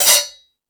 Dance 2000; E-MU Pro-Cussion

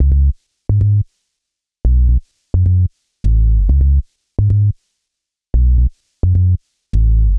Bass Loop 130; Music-Fx Loops 130; Equipped Music; Loungin House

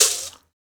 Mouth Bow, Africa; Roland LCDP11 Africa VOL-1; Mouth Bow 2

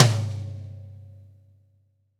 V-Pop Tom 43, Roland BK-5 Toms

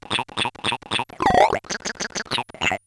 Bent Talking Teacher, Circuit Bent

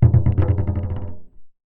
Marbles & Framedrum; contact mic; musicradar; contact mic madness; Raw

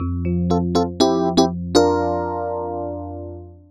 DX PIANO; East West; Twisted Textures-BT

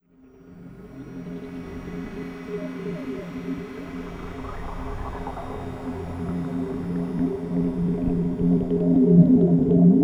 Liquid Bed; Beds; Sonic Foundry (Sony Creative Software) - Slow Silhouette

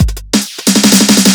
Speed loop fill, Drums Beats

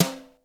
SLAP, BRUSH KITS; Crypton - DrumBase5000

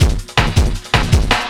LOOP L, Drum & Bass 160 BPM

